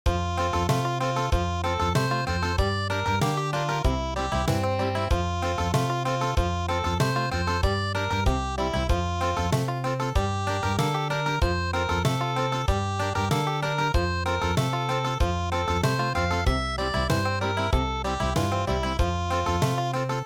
Country; EARLY ONE MORNING

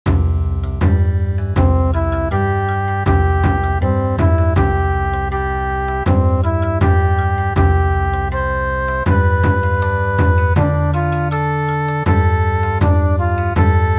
Bon Odori

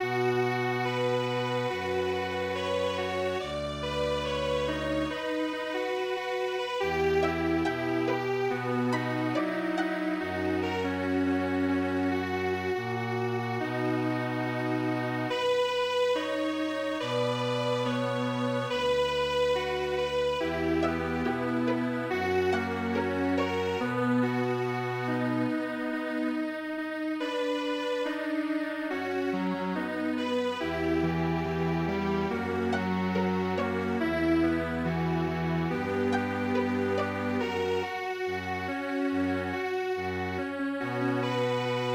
koujo strings

